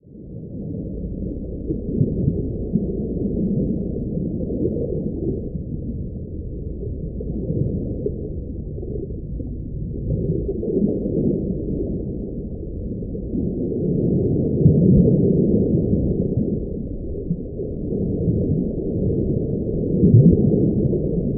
Underwater Ambience Deep Sea - Underwater Waves And Bubbles; Hollywood Edge; sound designer's toolkit v2